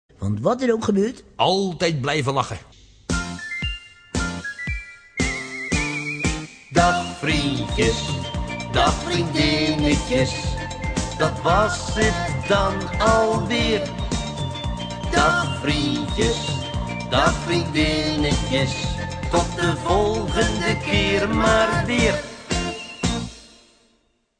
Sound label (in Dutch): eind tune